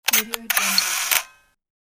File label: sound of photo apparatus